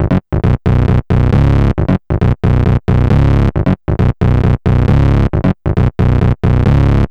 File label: BASS; Evolution 071